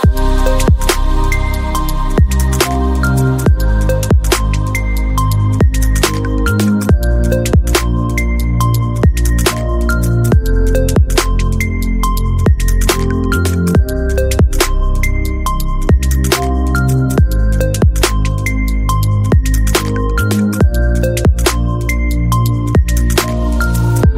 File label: iphone ringtone; رنة هاتف مودرن للايفون